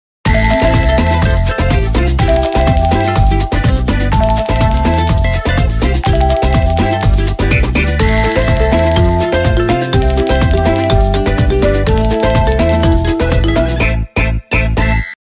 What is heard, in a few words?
passion